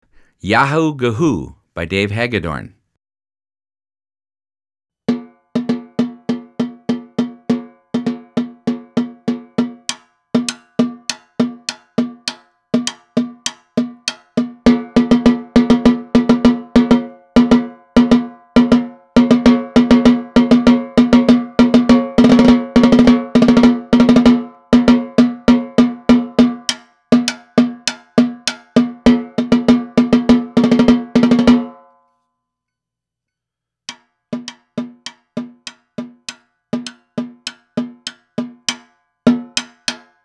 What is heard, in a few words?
gahu